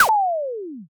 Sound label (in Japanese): zukyuun